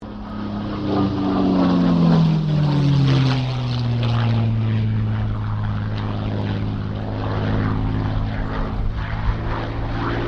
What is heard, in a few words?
AIRCRAFT CA-18 MUSTANG PASS; P51 /CAC-18 Mustang; Airplanes; Vehicles